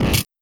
Criket Fx, Cricket

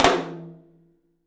open tom; 3D Sound; 3D Drums; 10 TOM

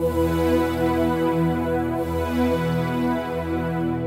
Pad, Keyboards

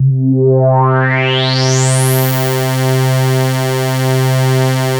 MOOG, BASSES; Masterbits - Vintage Voltage; VV BASSES

